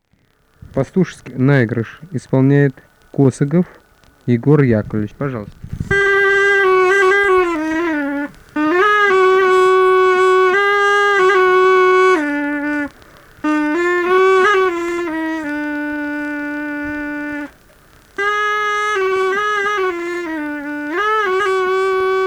Пастушеский наигрыш на пищике; Высокое

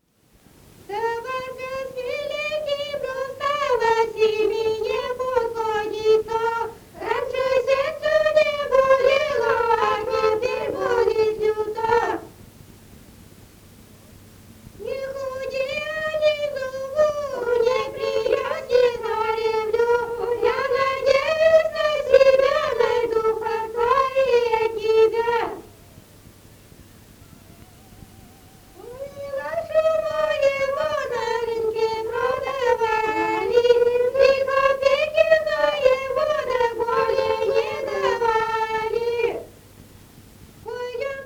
Товарка с миленьким рассталась, Малата

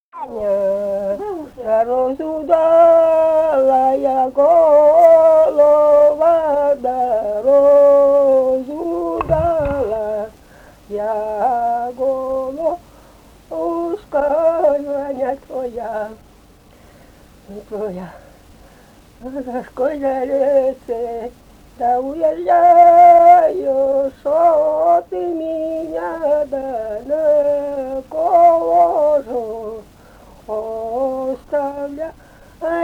лирическая; Экой- Ваня, да разудалая голова

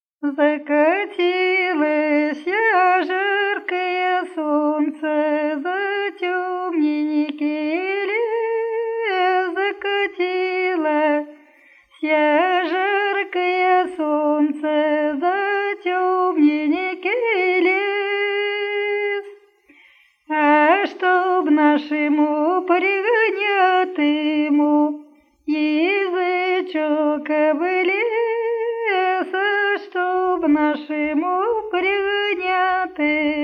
жнивная; Закатилося жаркое солнце

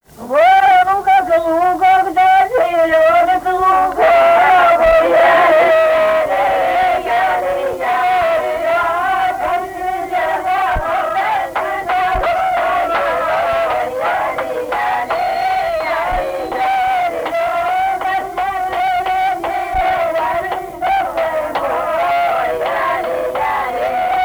Во лугах, лугах, да зелёных лугах (свадебная); Песочня

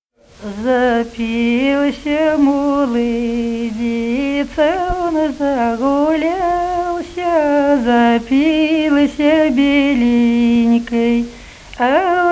лирическая; Запился молодец

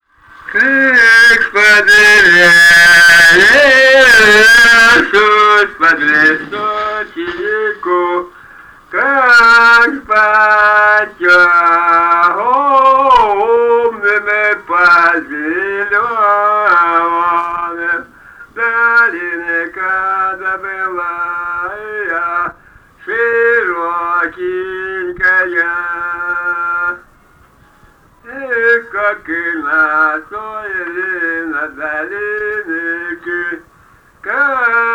Как с-под лесу, с-под лесочику (лирическая); Митьковка

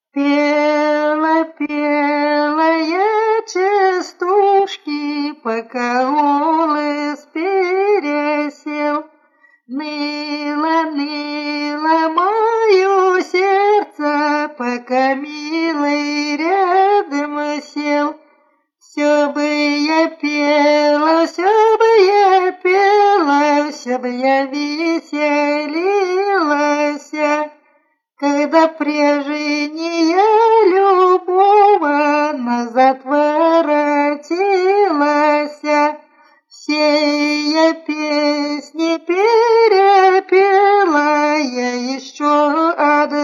частушки; Пела, пела я частушки

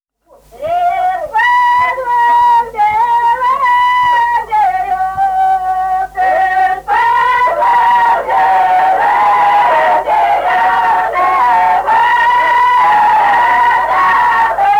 карагодная; Песочня; Из-под двух белых берёз

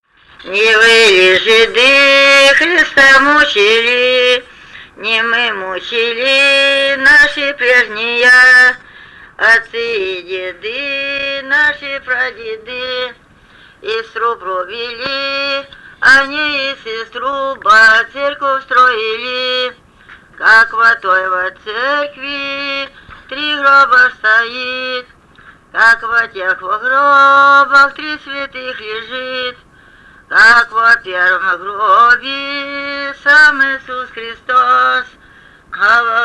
духовный стих; Не вы ли, жиды, Христа мучили; Климово

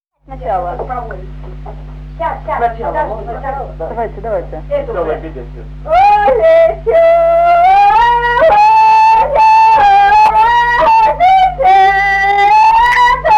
Ой, весёлая беседушка (лирическая); Доброе

